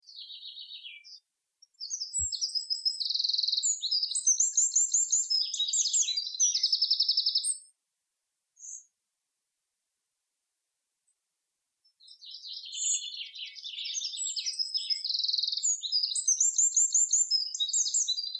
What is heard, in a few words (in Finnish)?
peukaloinen; laurinmaki